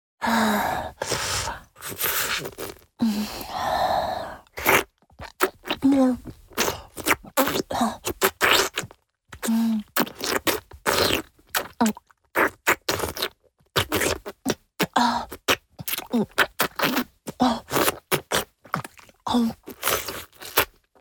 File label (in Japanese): チュパ音, 高音抑制; フェラチオ(中):頭を上下に振って陰茎をしゃぶる